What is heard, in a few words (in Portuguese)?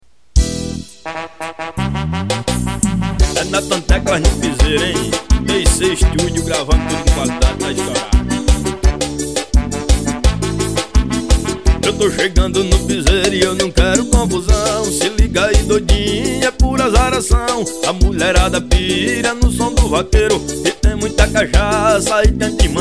teclas no piseiro